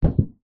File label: vehicles car bonnet hood pressure press hit bend footstep single